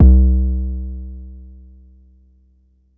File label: Bassdrum, Jomox Xbase09